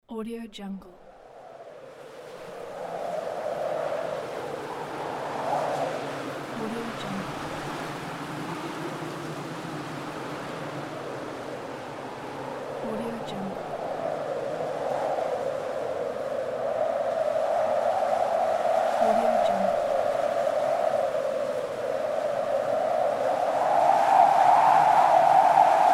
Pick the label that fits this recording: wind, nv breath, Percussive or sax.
wind